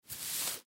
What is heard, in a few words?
Wig Big Clown Hair Take Off Head; Foley; Dressing; Human